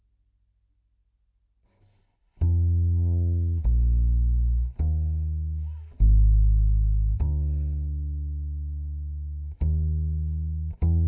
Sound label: C Bass DI